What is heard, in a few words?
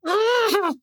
inmate pain